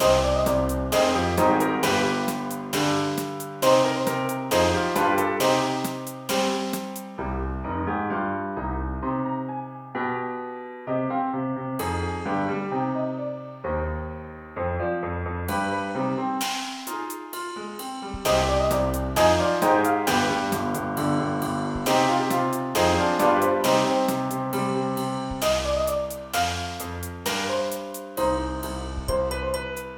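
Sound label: loop, music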